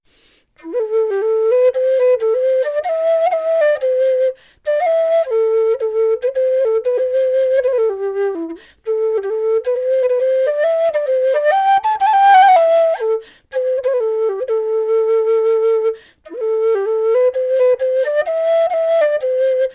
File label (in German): dixon lowwhistle alu melodie; Die Low Whistle ist die tiefere Variante der irischen Tin Whistle